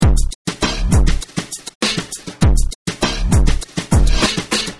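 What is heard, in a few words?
drum loop, underground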